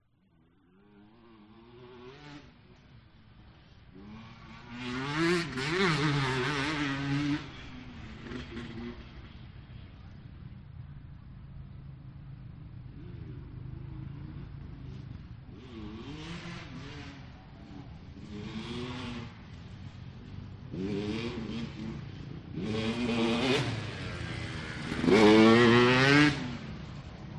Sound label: vehicles motorcycles motocross race pass